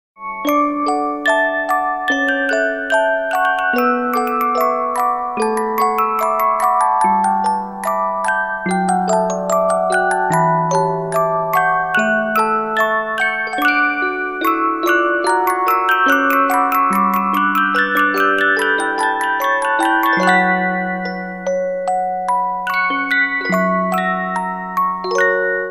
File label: Musicbox; Eternity